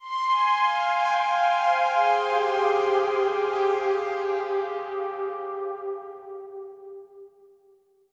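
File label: Synth F minor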